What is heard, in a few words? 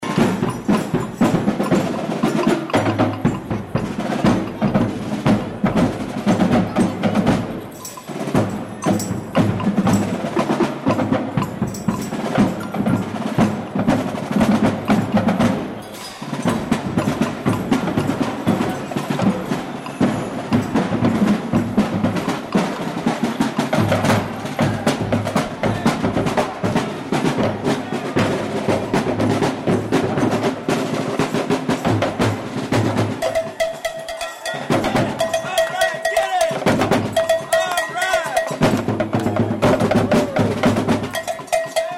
Drum Cadence Plus Banjo; 2006 Drums & Banjo; Marching Band